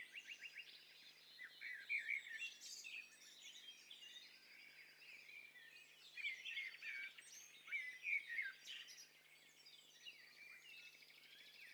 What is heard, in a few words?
Bird Cocktail Party; HiFi STEREO BIRD COCKTAIL PARTY Challenge